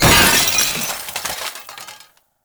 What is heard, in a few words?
loot crate small; Gameplay; Warframe; Entrati